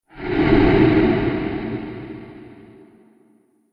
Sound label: Pyramid Phantom, ghost sound effects; Andrew Fleming